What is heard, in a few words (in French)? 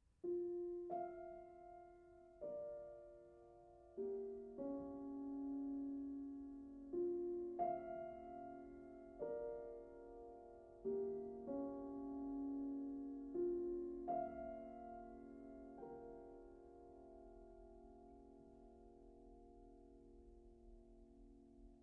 carillon